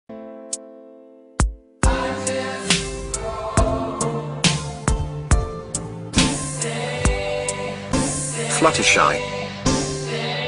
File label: Dubstep